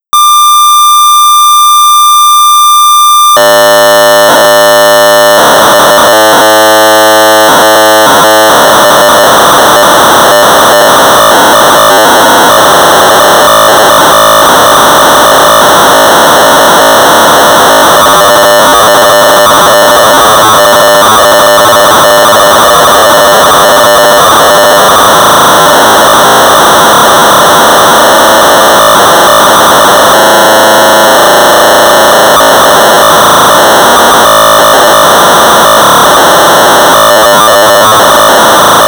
Sorcerer 1200baud; Galaxians (another version)

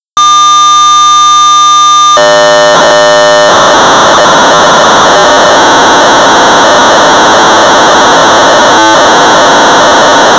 Sorcerer 1200 baud; Trots-horse-racing; BASIC

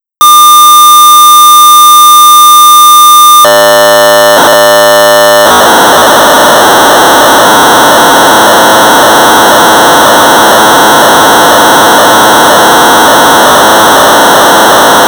Sorcerer 1200baud; Demo2-Interactive Budget Program; BASIC

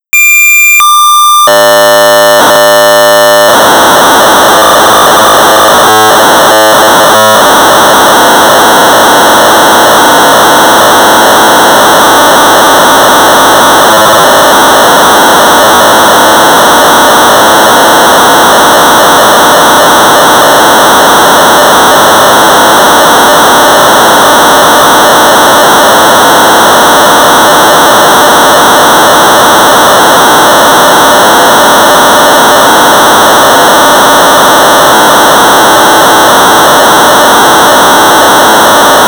Sorcerer 1200 baud; X-Nim; J.T.Staley; BASIC

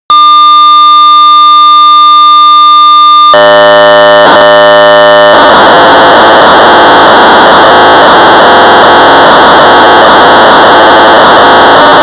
Sorcerer 1200 baud; Z80 Disassembler; Victor Tolomei; Quality Software; BASIC

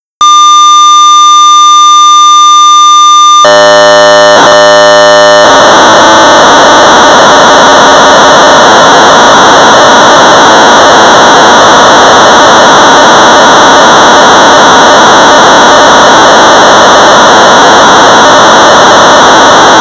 BASIC Sorcerer 1200 baud; Characters; Mike Walco

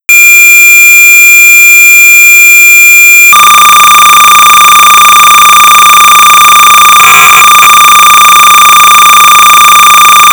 BASIC Sorcerer 300baud; Graphic A-Mazes; A.N.Afriat

